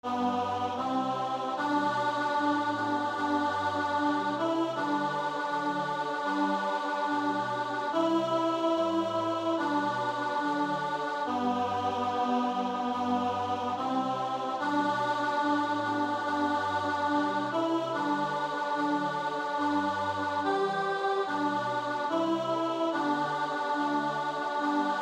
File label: God of Tempest God of Whirlwind Alto; choir